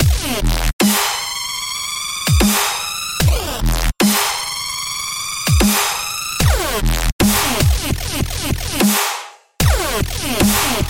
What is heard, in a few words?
Distortion excercise